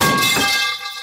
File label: metal pipe; Picture Puzzle